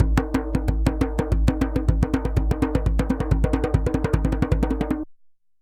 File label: DJEMBE ROLLS; Best Service; World Colours